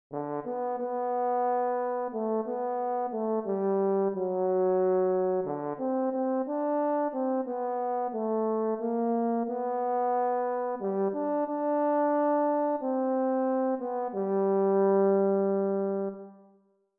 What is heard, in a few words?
Mel dict chromatic long